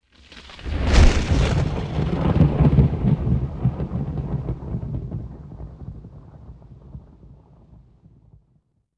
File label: Thunder; disasters